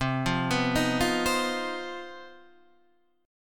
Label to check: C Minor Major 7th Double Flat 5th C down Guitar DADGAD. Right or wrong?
Right